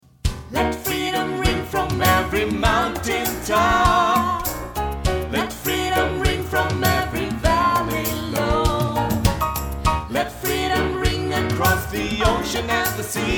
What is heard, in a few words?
Alt bas